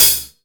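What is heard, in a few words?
EFEX hi-hat, FX DRUM; AKAI S6000 CD-ROM - Volume 1; DRUM RYTHM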